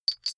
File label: small metal handling chink short; industrie wirtschaft; metall